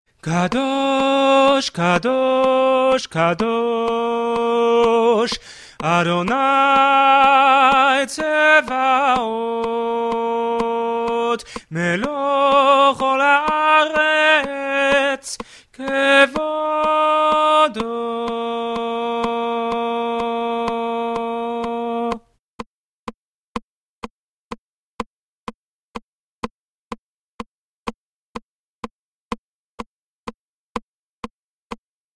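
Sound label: tenor, choir music; BCC choir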